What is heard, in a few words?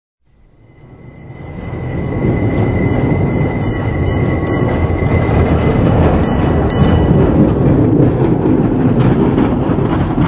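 tram passing by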